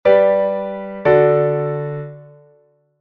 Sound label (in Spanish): cadencia plagal ejemplo